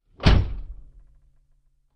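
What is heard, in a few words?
Int Door Close, doors; Cutlass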